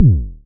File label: modular deep, Kickbank; Ableton Foundation FreePacks